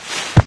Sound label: right foot, zombie poison; Source Engine; video game; npc